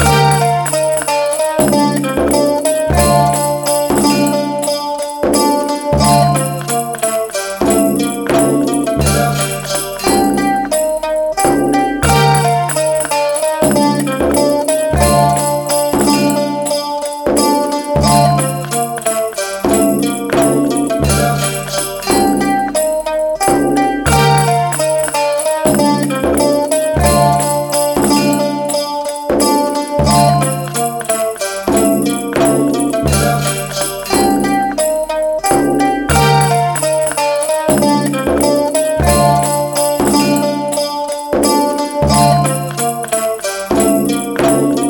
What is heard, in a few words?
lyre